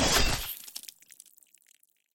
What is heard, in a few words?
Blade; Scrap Weapons; Zomboid